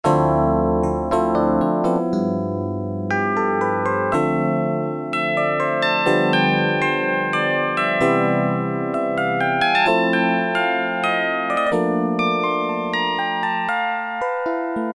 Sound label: Yamaha TX81Z; HiTine81Z